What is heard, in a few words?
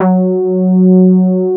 SB HORN; Wizoo - Powered Nord; STEREO LAYER